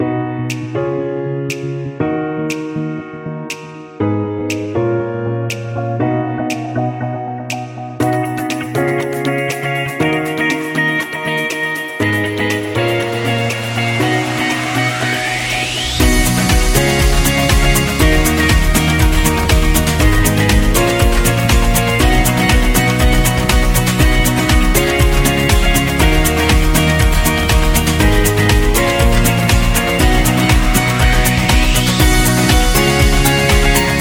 Corporate Pop

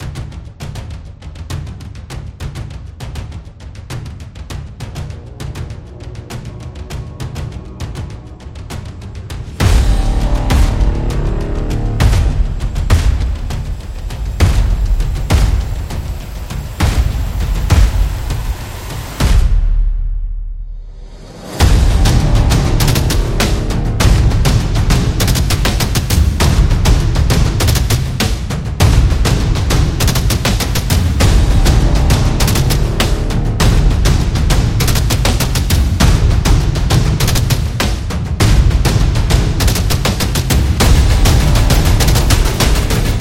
War Drums; battle drum

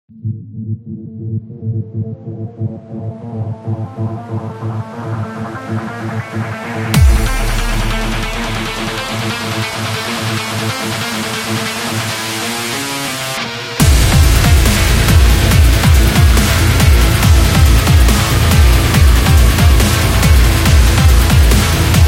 Action Game

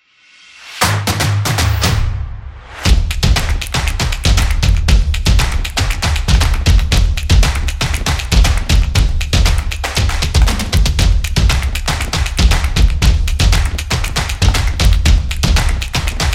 Beat Drum; high energy

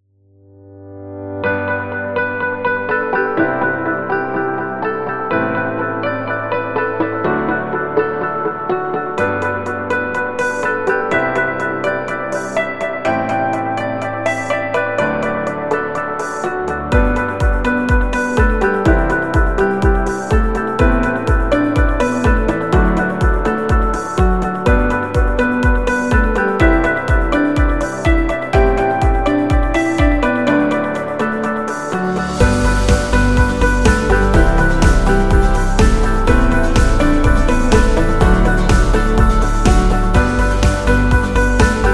Positive Background

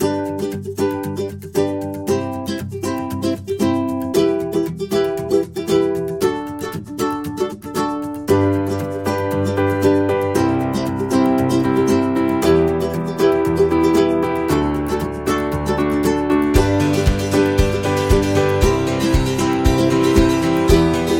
Upbeat Ukulele Folk

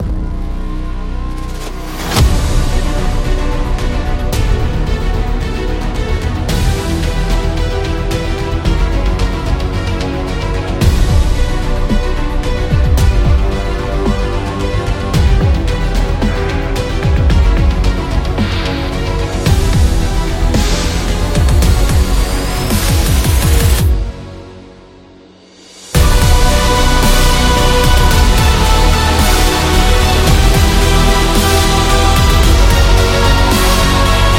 Dramatic Epic Dubstep

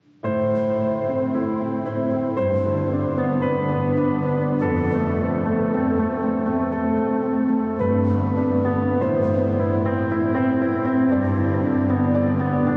Emotional Piano

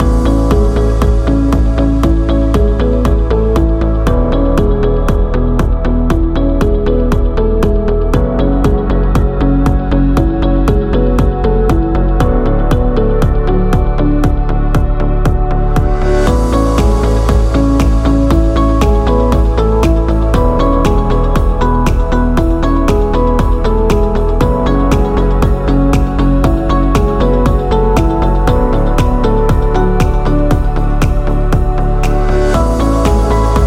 Commercial Loop

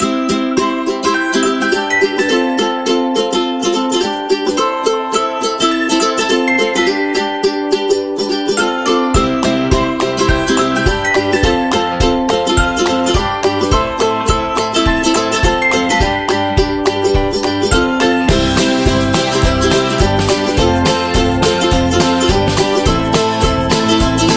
Fun Upbeat, ukulele

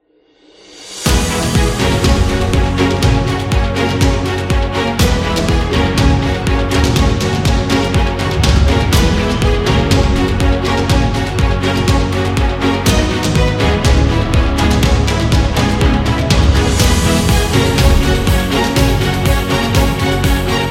Motivation Upbeat; medical background

